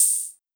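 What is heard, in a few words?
Hi-Hat Open, Luxor Passet; Hohner Rhythm 80; Drums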